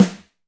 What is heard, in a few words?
Snare, Alesis DM5 Drum Machines